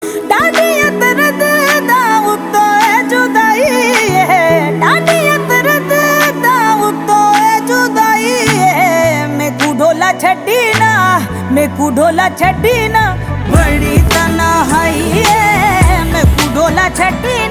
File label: Ringtone; shahkot